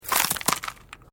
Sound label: Glass movement minor